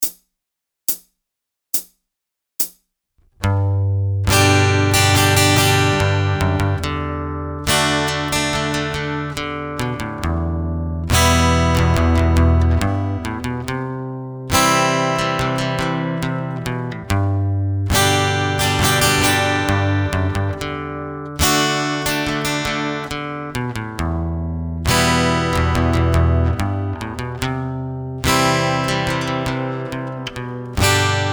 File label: A Subtle Leading Bass Run, guitar; Ultimate guide to strumming book